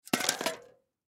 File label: Beer Can Set Down, Beer Can Set Down Full Closed; Set Downs (Sound Effects:Objects); Foley; Blastwave